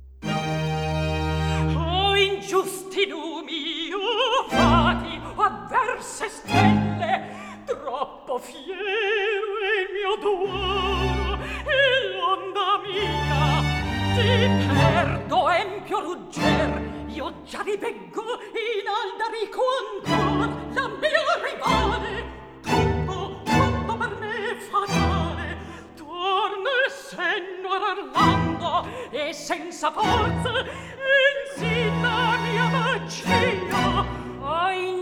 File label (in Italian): Recitativo accompagnato; Scena Ultima; Alcina; Antonio Vivaldi (1678 - 1741); Vivaldi - Orlando furioso (2004); Atto III